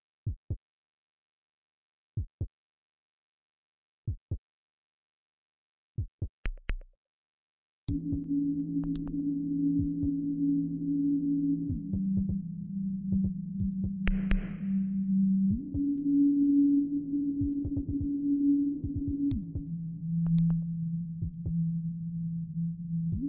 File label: heartbeat